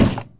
Wheel collision

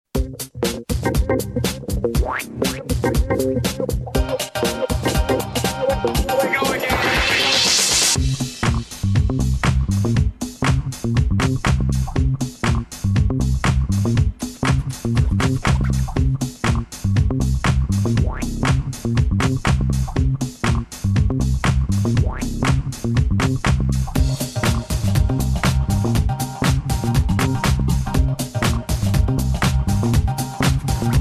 Mystery Beat; Fuzion Frenzy

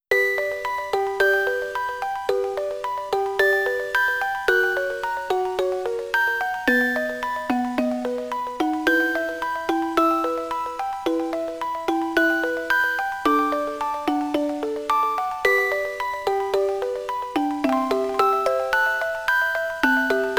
Character BGM; Meiru

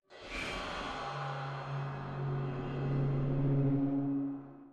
train boss defeated

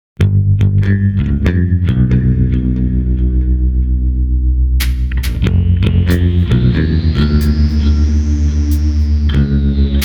wonderous bass run

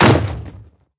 Gigantic bomb Box drop; Hero Tramin

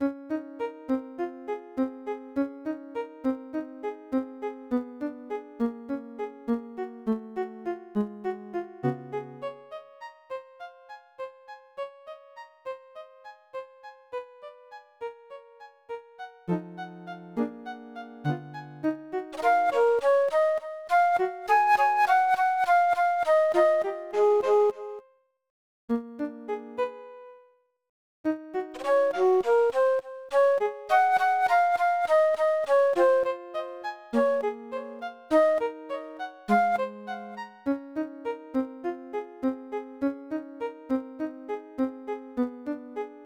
Room Sleep; Tomodachi Collection

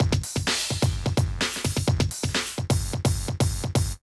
Jingle Mini Game Op; deca sports ds; nds

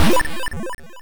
Unused Jingle; Gridiron Fight; arcade

